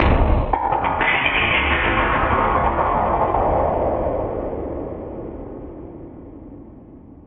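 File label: sounds of endings outro, disappearance fading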